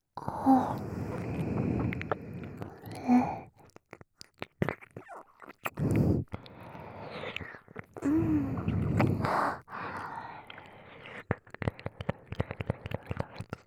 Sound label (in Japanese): 甘々なねちっこい耳舐め(左耳)